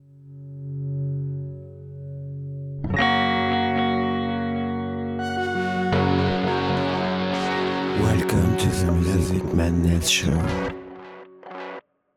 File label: music jingle